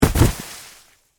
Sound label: Body Fall Snow; Horror, Body, Fall, In, Snow; Blood and Guts; BlastWave